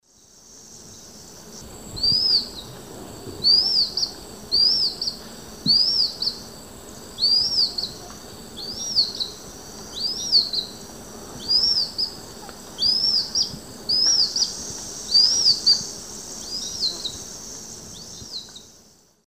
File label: Harpagus bidentatus, begging calls; S. America; Eastern Lowlands